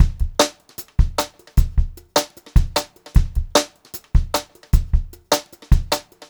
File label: Brushed Drum Loops; USB Soundscan vol.39; AKAI